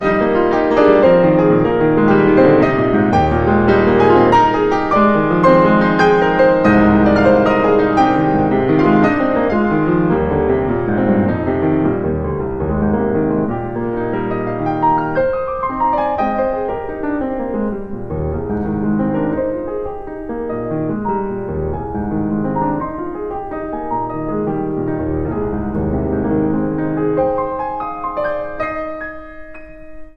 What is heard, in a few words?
Nocturne